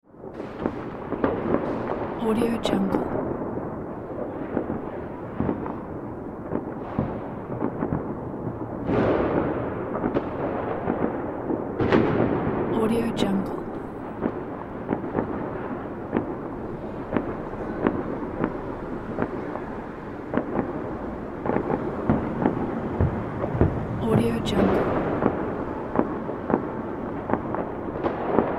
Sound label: new years fireworks firecrackers and explosions